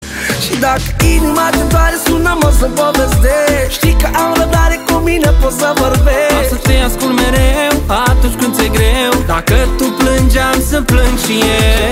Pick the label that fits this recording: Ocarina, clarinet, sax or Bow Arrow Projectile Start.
sax